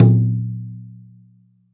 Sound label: PAIGU LOWF, PERCS-KIT; INIS - Opium